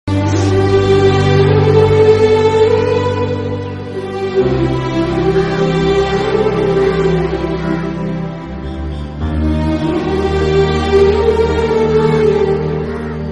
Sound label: instrumental